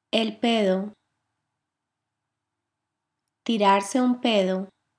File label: flatulence